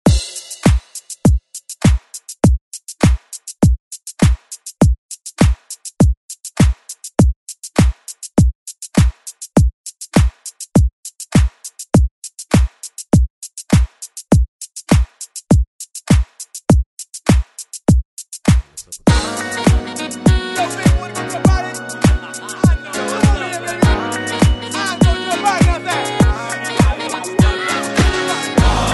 90s Re Drum

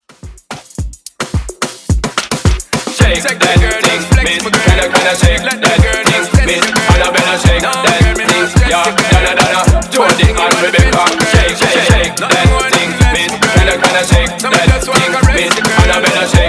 Reggaeton In Outro 108 BPM

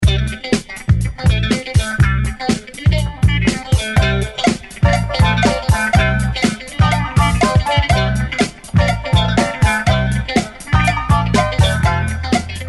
70s Reworks 122 Bpm; DJDOLLS

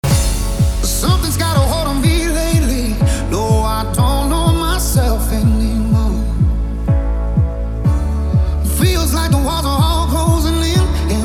Club Party Banger

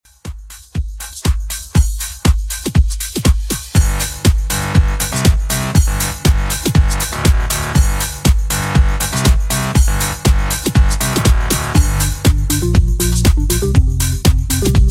90s Redrum 120bpm

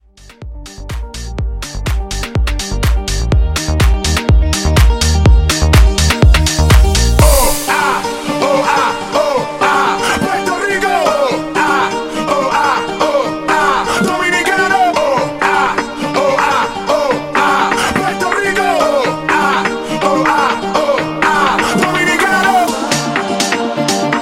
Hype 124

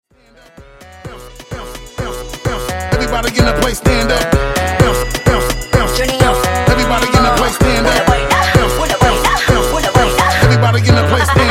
Hype Dj Intro Outro 128Bpm

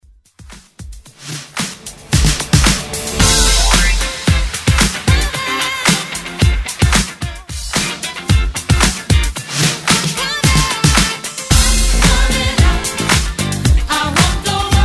Classic Rework

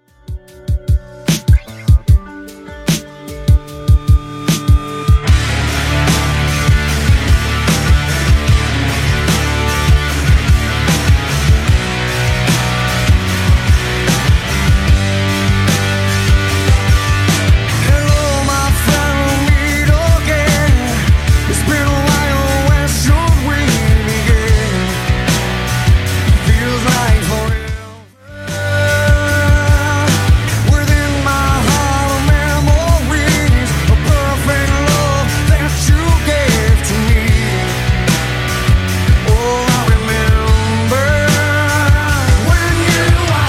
2ks Alternative Rock Redrum 75bpm

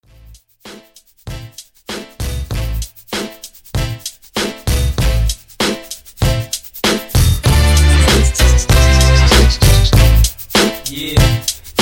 X Hiphop Mashup 97

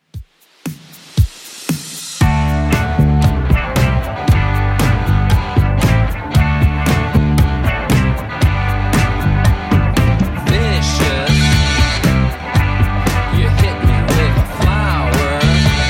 70s Redrum 116bpm

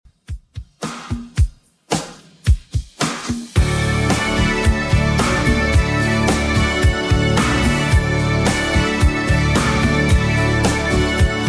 90s Redrum, 110 bpm

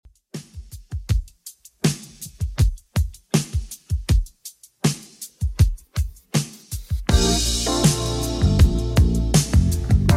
80s Redrum 80 bpm CLEAN

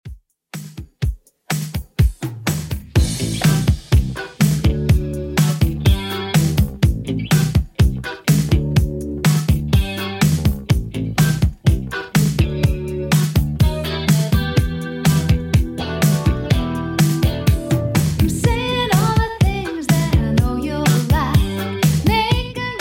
80s Redrum 124bpm

